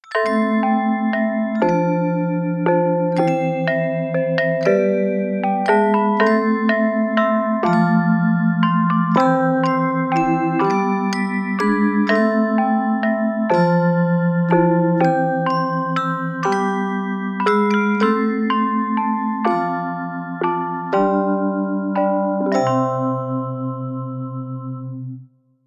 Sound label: gamelan pops